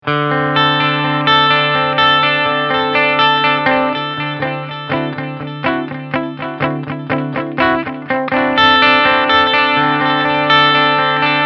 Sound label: delay